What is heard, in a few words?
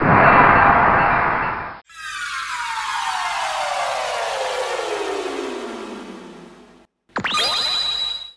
magic